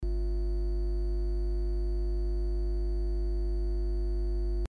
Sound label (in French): Defaut sonore hum